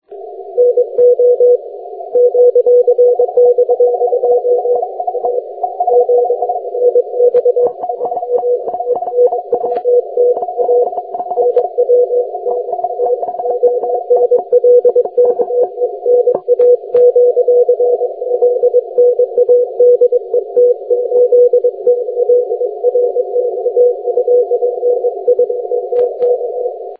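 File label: 40m continuous wave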